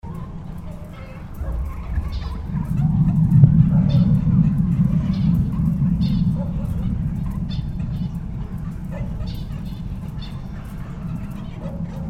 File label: Environments; TSB